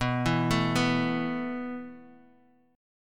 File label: B 7th Suspended 4th B down Guitar Standard; B7sus4 arpeggiated; capo=3